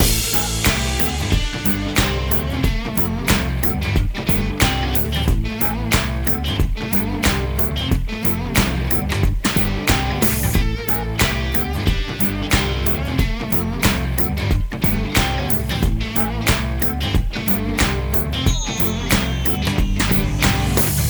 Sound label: Music Chill; Learn to Fly 3